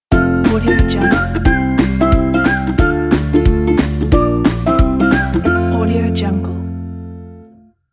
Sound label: happy logo; hitslab